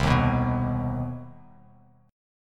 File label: C Minor Major 7th down, Bass Standard, chord; strummed; JGuitar